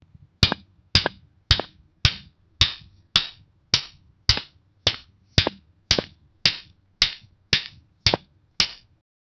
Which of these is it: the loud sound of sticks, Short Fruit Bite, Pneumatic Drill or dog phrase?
the loud sound of sticks